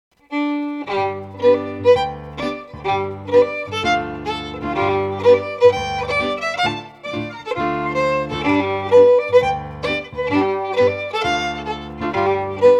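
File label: Reel, Scottish Fiddling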